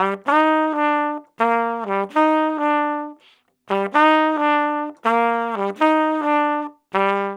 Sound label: 130 C Flugel Horn